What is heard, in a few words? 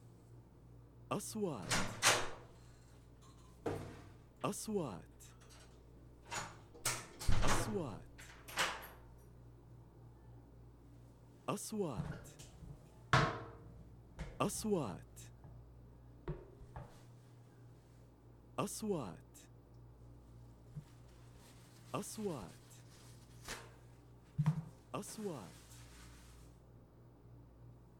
door open bathroom get in to bathroom